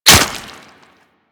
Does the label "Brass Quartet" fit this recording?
No